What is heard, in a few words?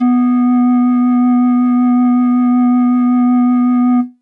Pan Flute DX100 B2